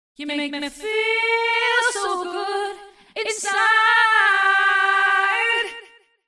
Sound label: Dual Delay Panned Delay Wet; ClonEchoes; Syntheway